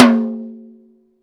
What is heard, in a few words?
TOM, Drum Dry; East Collexion; Drum 1